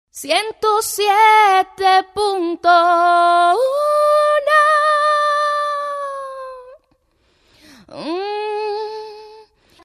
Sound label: jingle dial 1071 version normal, Dial 107. 1 Cantado